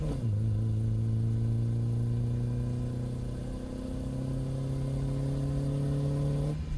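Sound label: engine high loop; Blind Drive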